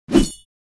Metallic whoosh metal swipe

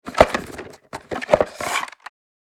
Board game box open; Entertainment and Leisure

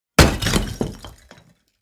Big building block thrown on asphalt

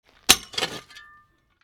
Metal shovel dirt impact

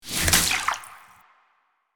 Water magic spell small impact

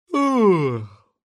Male yawning short

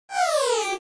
Science fiction cockpit alarm single alert

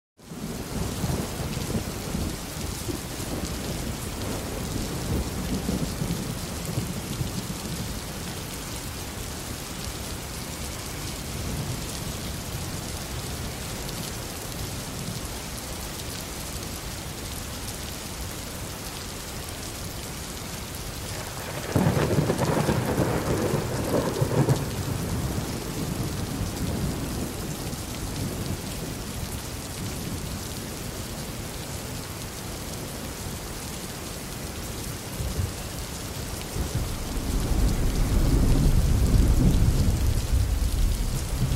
Urban thunderstorm with heavy rain

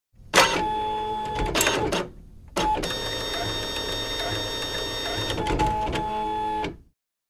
Epson inkjet printer starting up

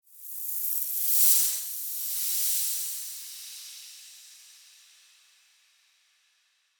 Cinematic freeze transition

